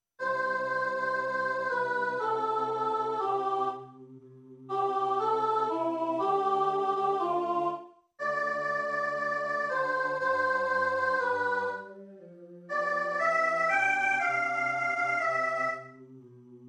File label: Soprano; Locus Iste; rehearsal